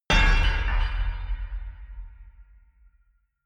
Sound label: metal horror clang, metall; industrie wirtschaft